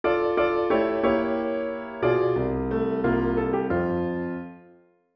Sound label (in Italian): Formula conclusiva; Versione Dagmar Weise